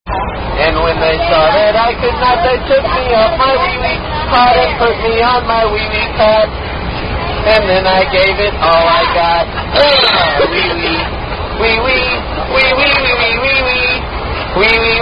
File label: Wee Wee Song; Mentions Additional Verse About Wee Wee Teen